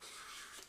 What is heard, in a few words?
peel pumpkin